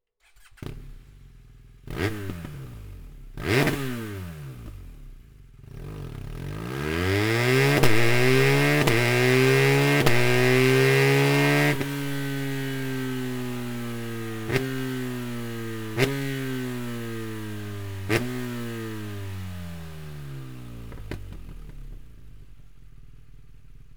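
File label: Slip On BMW R 1250 GS, exhaust; slip on line akrapovic; adventure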